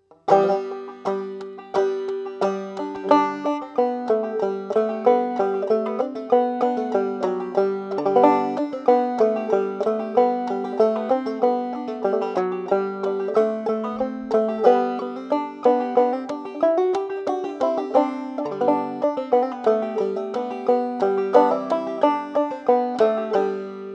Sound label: deck fast, banjo; Christmas